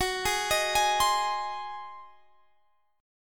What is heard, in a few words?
Ab 7th Gb